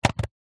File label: mains power plug plug into extension block socket australia; household